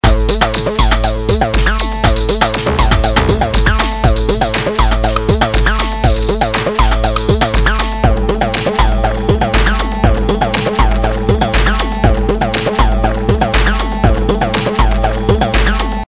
Unmix Drums, Drums zusammen mit der Synthie-Sequenz; Preset Drum Sizzle; synthetischen Drums; Zynaptiq